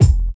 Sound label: natural hip hop kick sound f key, Royality free kick tuned to the F note; kick drum samples